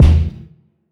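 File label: live kick reverse; Kicks; drumkit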